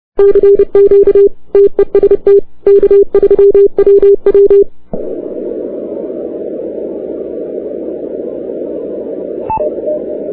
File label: cw